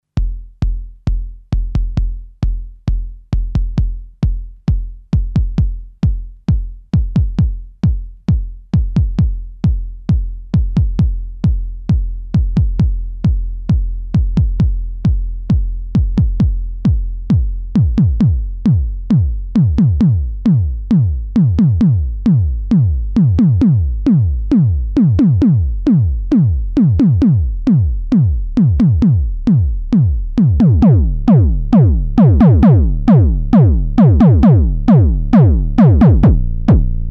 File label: 909 mod; tr909